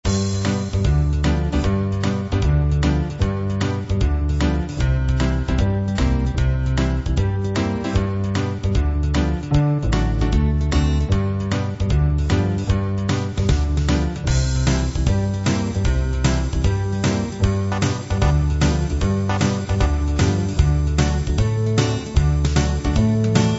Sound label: texas 2 step